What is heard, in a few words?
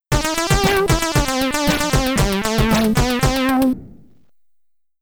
SID Stereo; one on one jordan vs bird; commodore 64